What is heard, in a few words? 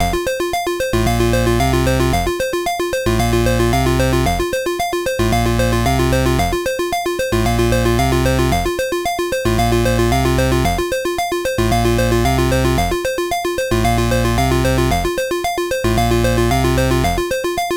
Wizards & Warriors 1 Low Energy; NINTENDO; NES RINGTONE PACK